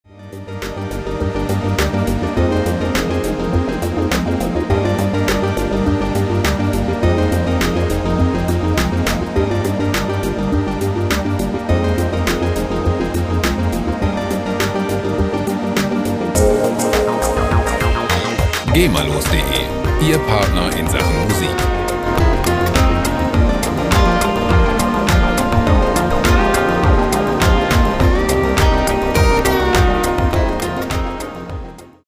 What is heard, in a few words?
screwdriver